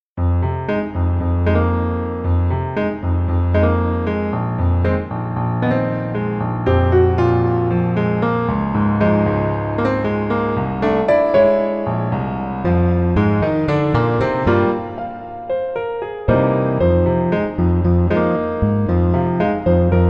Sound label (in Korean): Piano ver 멜로디MR 남자키